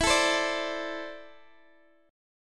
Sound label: E 7th Suspended 4th Sharp 5th E down Guitar Open G